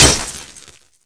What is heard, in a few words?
combat knife hit world, weapons; tf2